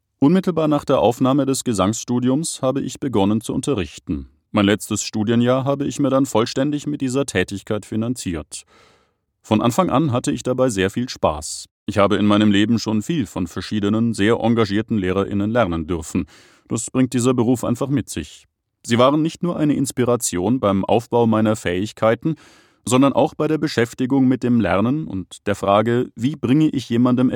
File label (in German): Singen; Unterricht